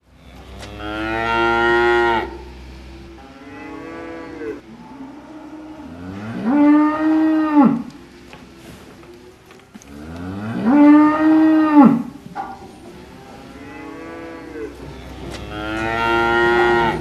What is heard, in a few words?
Sounds of Cows